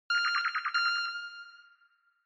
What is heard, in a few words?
Retro Anime System Short